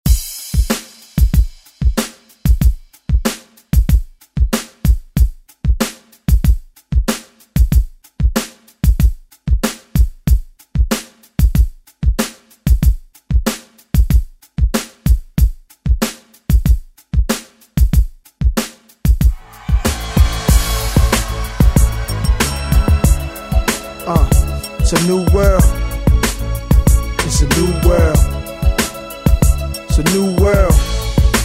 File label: 90s ReDrum; DJ Mhark